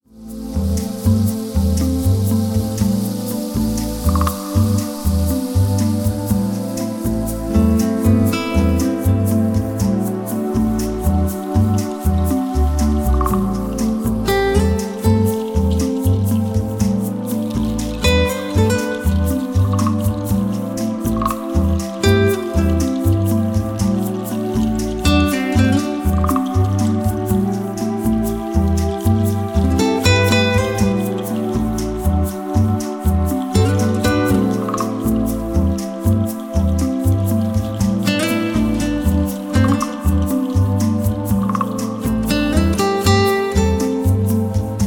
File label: African Melody